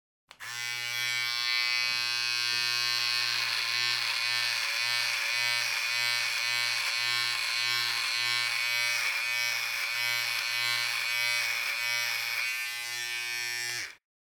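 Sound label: sound of trimmer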